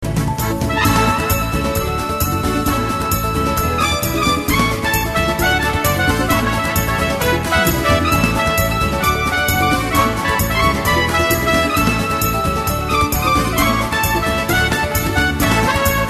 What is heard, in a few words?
French West Indies